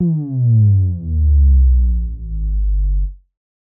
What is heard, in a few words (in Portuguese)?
explosao; meme